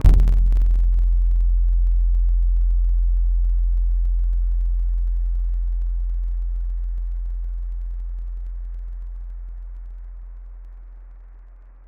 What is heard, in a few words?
Keys Greek Harp; Synthetic's Serum Collection; ESW X Keys